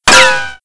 Hit Metal; Sword God; Playable Tutorial Level

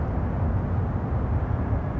London Ambience Loop; Playable Tutorial Level; TR3

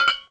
Gem Drop On Floor; Playable Tutorial Level

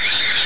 Giant Metal Wheels; Playable Tutorial Level; TR3

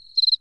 Cricket Loop; Playable Tutorial Level; TR3

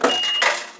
Vending Spit; Playable Tutorial Level; TR3

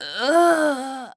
Death Knees; Lara; Playable Tutorial Level

